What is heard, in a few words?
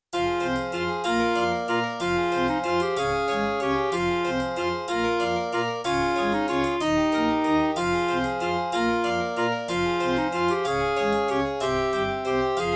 Roland XV-2020; Death Gate